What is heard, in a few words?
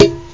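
bass drum; kicks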